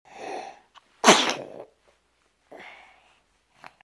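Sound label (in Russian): чих ребенка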